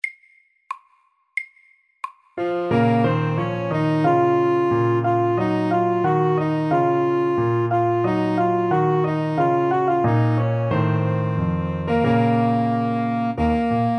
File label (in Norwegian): Gammel fransk melodi